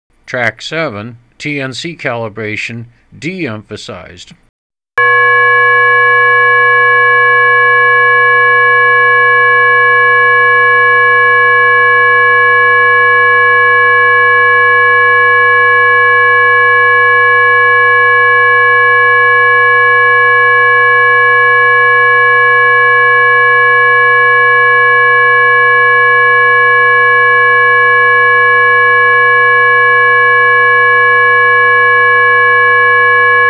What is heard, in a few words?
kpc3 plus cal tones de emphasised